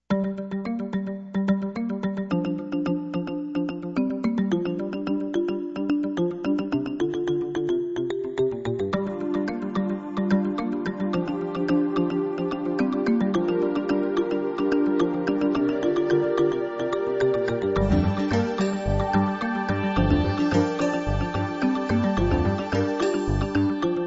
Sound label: jungle beat; Atmospheric